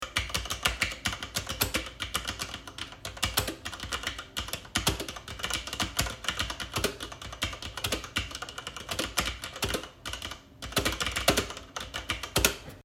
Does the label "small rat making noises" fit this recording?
No